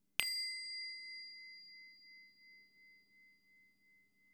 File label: small bowl ext sound